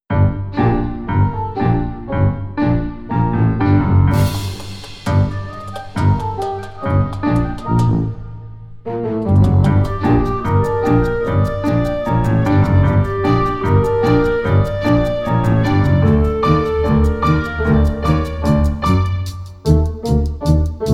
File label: stereo MUSIC only